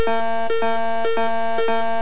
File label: FLOOD